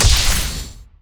Shield; orianna

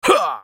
effort; Draven

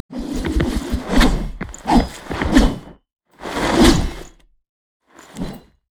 emote recall; LoL; gangplank skin06

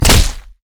pounce; nidalee base

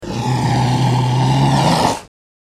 lizard dying

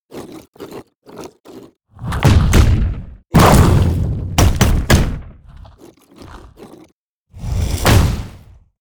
ancient golem angry; LoL; monsters

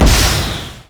r booster activate; LoL; jinx base

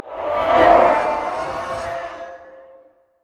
passive dead souls; LoL; thresh

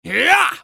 spell cast effort; Pantheon

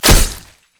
takedown attack; nidalee base

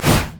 lightsaber missile; riven

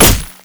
basic attack; LoL; skarner

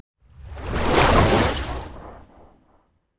recall wind down; LoL; syndra

